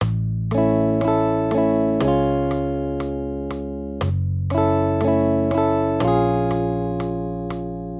Accompaniment, Major Triads, Root Movement Four Semitones, A-Db 120